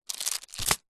foley unopened greetings card in cellophane wrapper set down; zapsplat